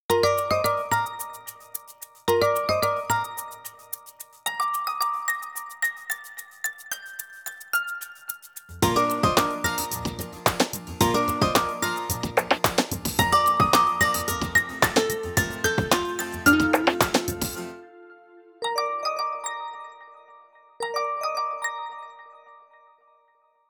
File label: journey, ringtones; OnePlus 6